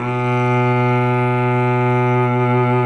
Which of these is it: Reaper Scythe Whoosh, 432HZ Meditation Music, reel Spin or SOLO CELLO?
SOLO CELLO